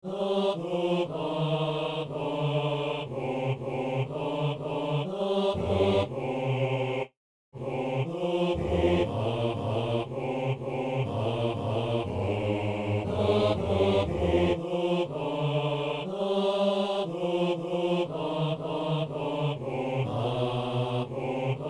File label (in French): soliste et choeur hommes; O nuit, de quel éclat